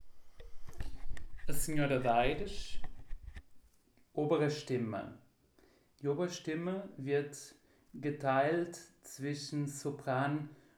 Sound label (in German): Obere Stimme; A Senhora d Aires